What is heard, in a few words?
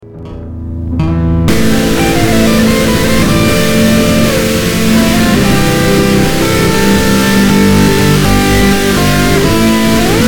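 guitar test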